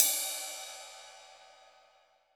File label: TIP, 50S JAZZ KIT; Northstar - New Gold VOL-1